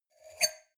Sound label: wine glass grab table slide medium; berufe; gastronomie; soundpool